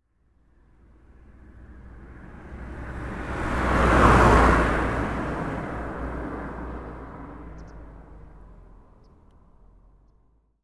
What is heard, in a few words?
transport car pass country lane